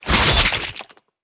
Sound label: Spider Trap Activate Spit; Lurker; Evolve; Gorgon